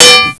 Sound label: dog only hedgehog